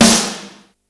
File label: snare, Yamaha RY-8